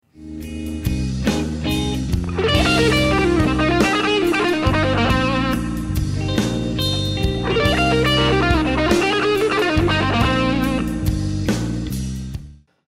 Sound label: C Minor Blues Lick, guitar